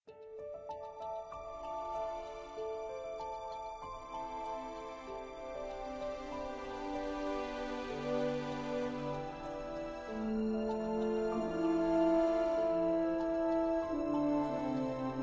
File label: bgm player turn nature; devils demons android game music